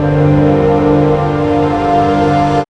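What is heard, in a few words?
synth pad; E-Lab; X Static Goldmine 1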